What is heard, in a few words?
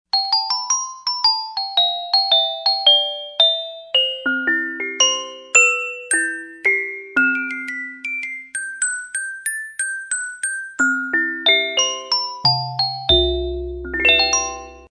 Glocken, Yamaha TG55; Factory Presets